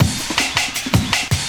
LOOP L, Drum & Bass 160 BPM

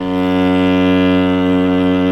C#1 SOLO CELLO

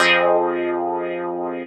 stab wobber, stabs

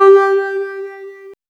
LEAD L; AMG - Vince Clarke Lucky Bastard

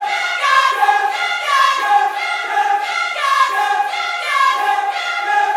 CIR YES, Vocal Gospel CIRCLE LPS; Vocal Planet Vol1 Gospel

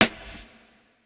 Kit, breakcore Drum Hits

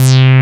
Hybrid Bass C3; Digital Sound Factory Xtreme Lead

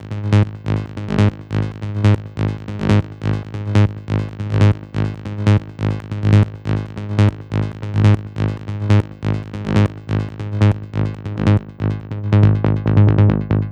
140BPM G#; Vengeance - Essential Clubsounds Vol.4

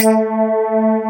wet bass; Wizoo - Powered DX

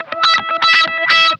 OVER WAH, Funk Guitar; 90 s Sample CDs; Zero G

